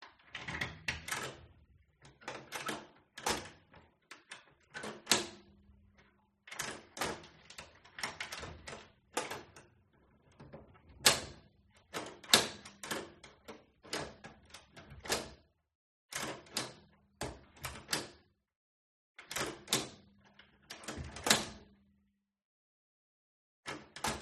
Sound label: LOCK Front Door Various Patterns Distant Perspective